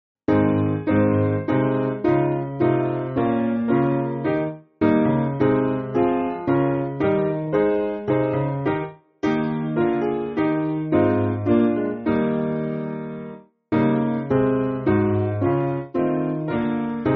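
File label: Piano, Music Backing Files for Churches; Not Far Beyond; Manna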